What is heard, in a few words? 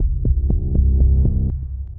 Contour Bass, Brooding Bass; Instruments; Ableton; Mood Reel